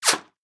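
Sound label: ricochet, weapons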